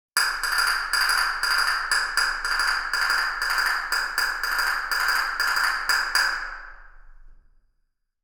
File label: castanets rev dynamic; ambisonic; A0 A360 E30; FOA; binaural; Reference (HOA)